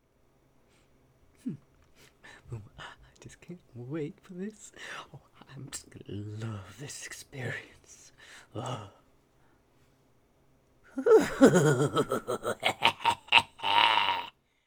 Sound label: Male Idle